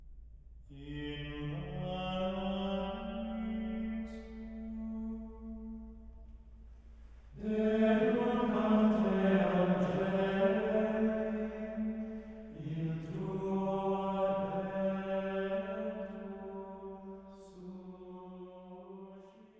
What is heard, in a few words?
Antiphon In Paradisum